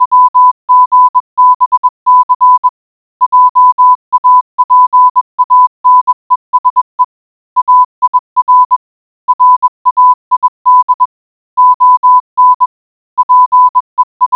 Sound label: morse code